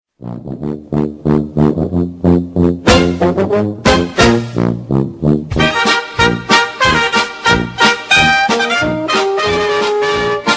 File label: tv theme; koniglich bayerisches amtsgericht